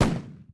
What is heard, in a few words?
Hatchling Explode